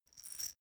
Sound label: small glass pepper grinder turn; industrie-wirtschaft; gastronomie